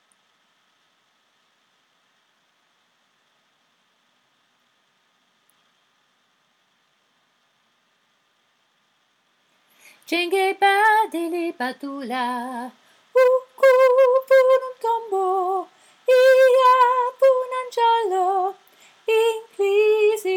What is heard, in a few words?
SOPRANO